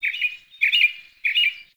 Forest Open Glade Bird Med, Day; Ambience

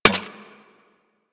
Laser Impact Metal; Surface

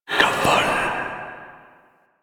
Fire Whispers; Weapons; Warframe; Tenno; Grimoire

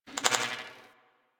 Beep Stutter; Warframe; Ambience; Corpus

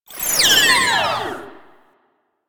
Conduit Proj By; Sentient

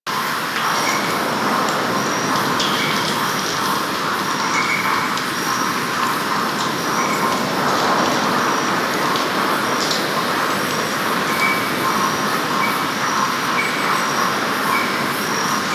Forest Exterior Night Calm Amb; Warframe; Ambience; Grineer Forest

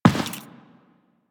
Step Default, footsteps; Warframe; Teshin

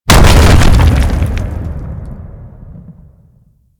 Golem Belly Slam; Warframe; Attacks

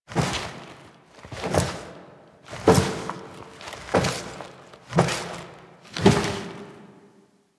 Intro Steps Foley; The Second Dream; Stalker

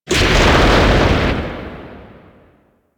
Elevator Stop; Warframe; Ambience; Corpus Gas City; Gameplay Remaster

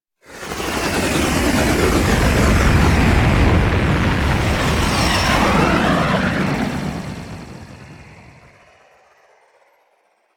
Drop Off, Vehicles Flight; Blue Sky Liset

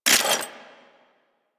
Grenade Launcher Mech; Warframe; Weapons; ThanoTech

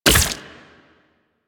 Rifle Mech; DE; Warframe; Weapons; Sentient; Sent Rifle New War

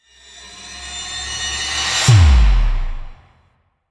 Cave Figurine SFX, Doll Assembly; Duviri; Teshin

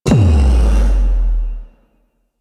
Backstab Cast Start; Warframe; Warframes; Pax Duviricus

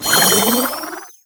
Mushroom Capture Start; Nokko; Warframe; Nokko Colony; Mushroom Hunt

